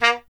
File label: TP A#; Miroslav Vitous; Solo Instruments VOL-1